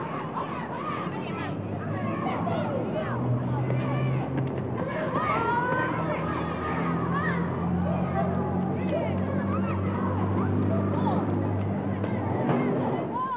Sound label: Light Street Amb; Levels Hub Diner; Light Street Amb Kids